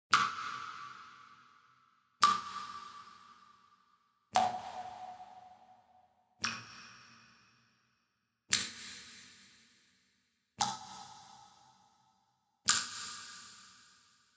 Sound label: water